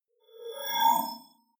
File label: swell short haunted; sweeps-swells